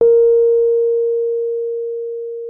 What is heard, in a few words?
A#4, RHODES; Xsample VOL-1